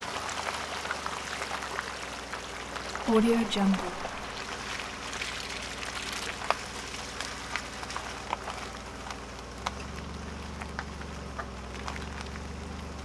vehicle through mud recorded close to tire slow driving